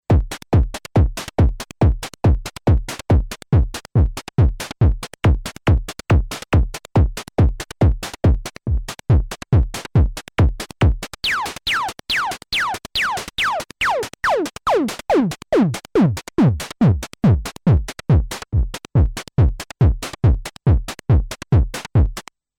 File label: Korg Monologue Hard Run LFO